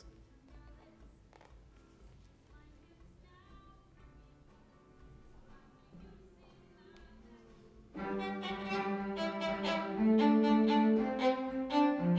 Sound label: Violin